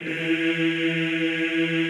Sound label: EEH E2, Extended Classical Choir; Best Service